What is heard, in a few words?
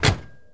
Firebird Door Close; Automobiles